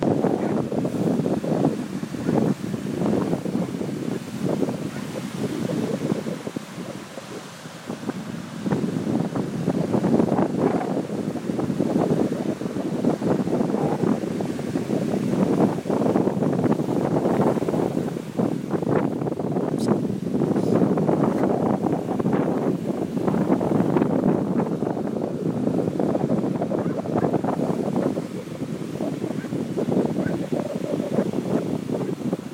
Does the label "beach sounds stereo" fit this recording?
Yes